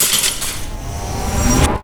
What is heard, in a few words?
Assault Weapon Reload